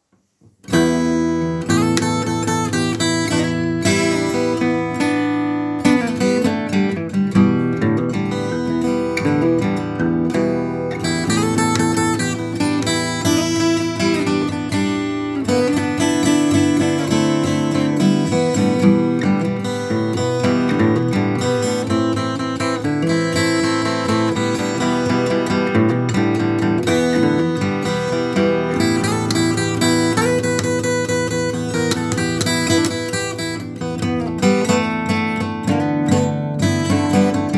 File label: Little blues in E